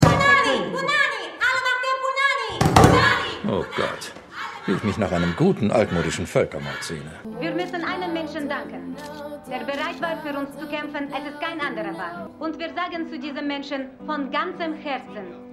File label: Girl Band; Bridget Jones 3